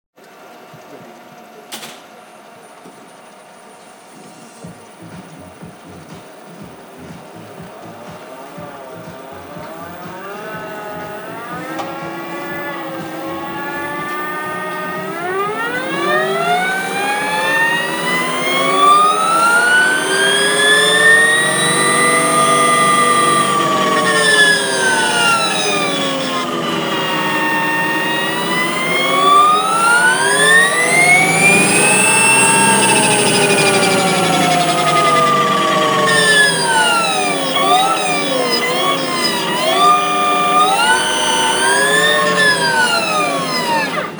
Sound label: HD livewire